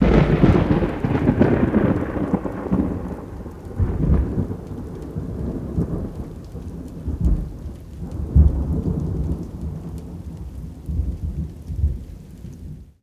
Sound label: Thunder Crack Good, thunder